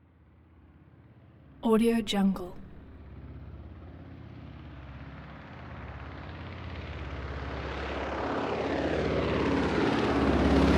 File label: transportation prop plane ju52 fly by overhead from front